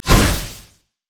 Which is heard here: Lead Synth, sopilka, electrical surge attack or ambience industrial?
electrical surge attack